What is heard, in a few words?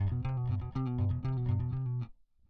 bass